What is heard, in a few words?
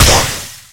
slice, weapon; Nuclear Tech